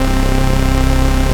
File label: SYN K-2000, Roland